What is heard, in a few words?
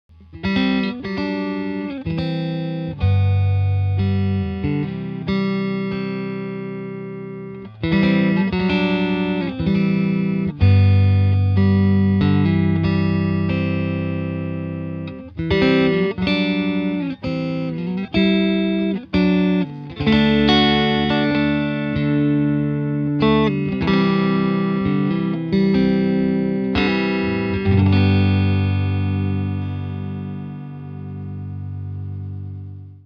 Electric Strumming Dual99v500